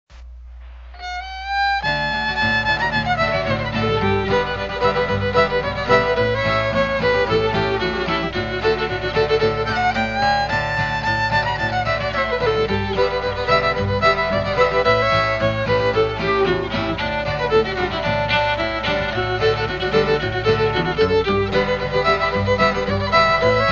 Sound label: Swing